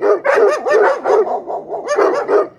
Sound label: DOGS, AMBIENT; RallyC; CD-Gamer 2000-05